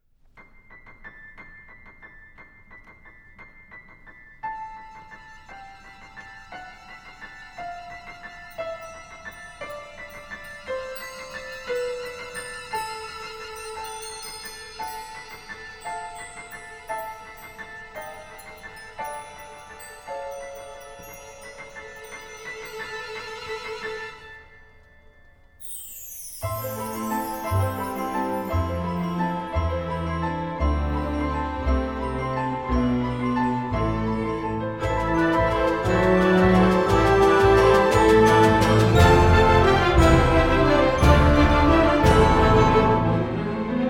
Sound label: Ukrainian Bell Carol